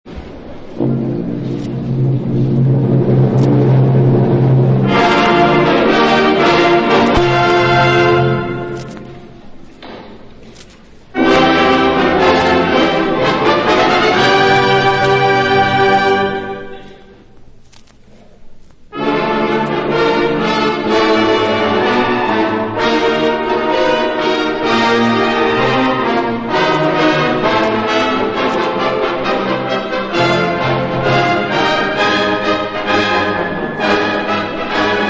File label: Fanfare